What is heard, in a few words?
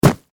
Weather Snow Impact Boots Jump On Snow